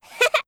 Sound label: "hehe"